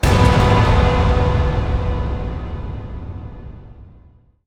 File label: Invoke Scenario Dramatic Sound; dark arcana the carnival